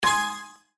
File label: LANDED BONUS; Genies Wild